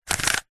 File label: leisure puzzle cube single rotate; rubiks